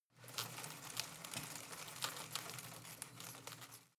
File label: home plant leaves rustle rattle short